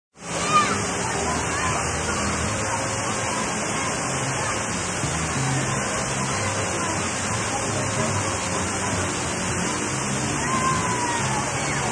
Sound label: Pool Wtr Fl, Pool waterfall with distant crowd noises.; Pools